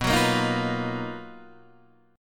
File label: B Major Sharp 11th, Guitar, DADGAD